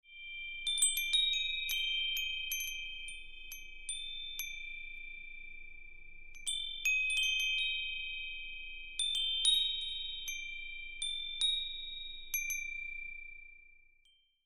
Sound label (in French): Son carillon; Jaspe des Prairies